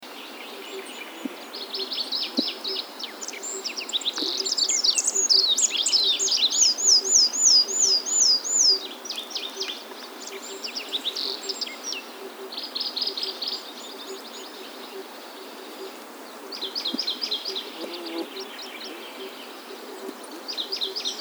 Olive backed Pipit

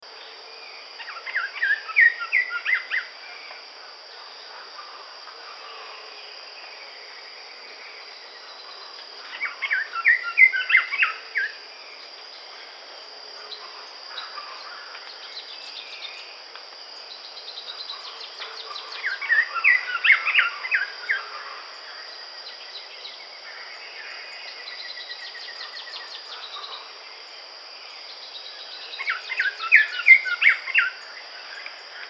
Red throated Ant Tanager

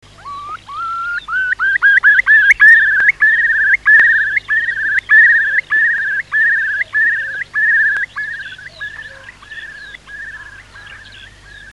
Eurasian Curlew, songs

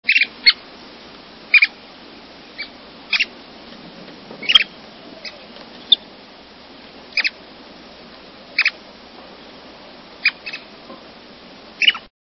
Wilsons Plover

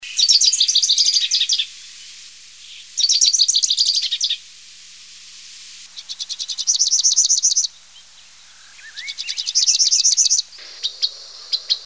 Rufous capped Warbler

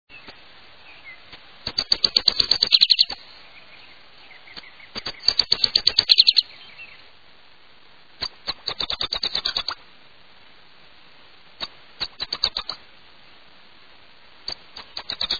California Towhee

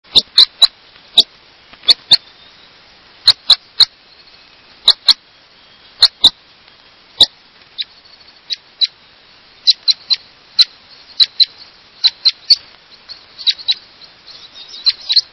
Red Crossbill

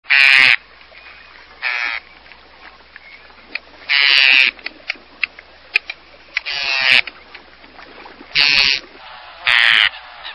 Forsters Tern, songs

